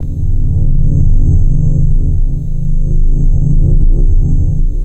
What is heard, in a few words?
Pod, ambience spaceship